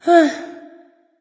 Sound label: sigh; daji